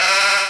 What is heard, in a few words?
Sheep; breakout; farm